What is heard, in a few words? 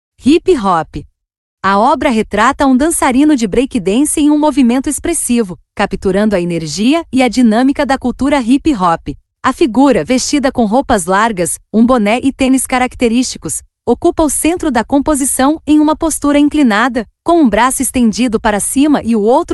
Hip Hop; Espaço de acessibilidade